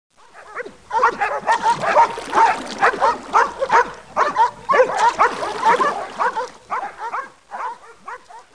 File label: DOG; Sound Library 1